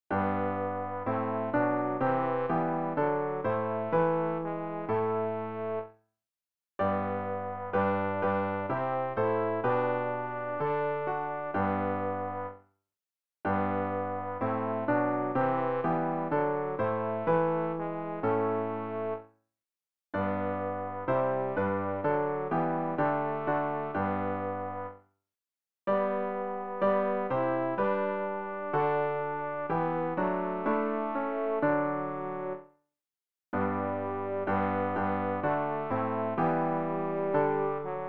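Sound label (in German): tenor, Übehilfen; Ref. Gesangbuch; RG 041: Jauchzt, alle Völker, preiset alle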